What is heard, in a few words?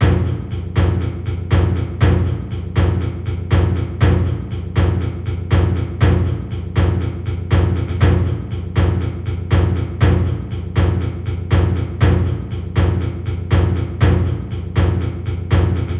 Sticks - 120BPM; Percussion; Ghosthack; SkyRealm; Percussion Kits; Kit 19 - Dragon Sword